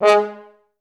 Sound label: FH STAC G#2; Best Service; Peter Siedlaczek's Advanced Orchestra